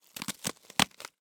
Cardboard, honeycomb, crush, rip, tear, pop, short; Eiravaein Works; Vaeyan IV